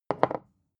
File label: bottle put down tipping